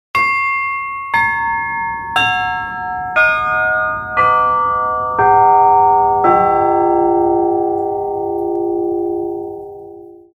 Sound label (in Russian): 7 kolokolov; Hibini